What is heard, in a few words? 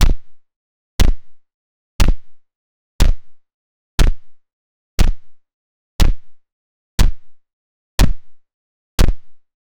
short soft gun, mobile game